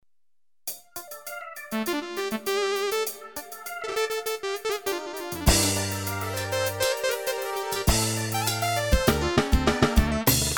rai

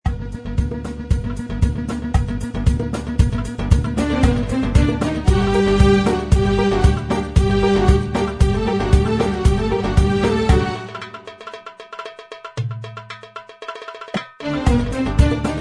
genesys pro oriental

